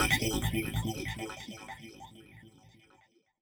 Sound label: TECHNO FX 140